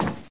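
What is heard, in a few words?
metal, footsteps; hl2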